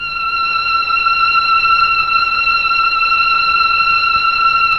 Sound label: STR, Melo. Strings, Tron Strings; Roland LCDP09 Keys of the 60s and 70s 1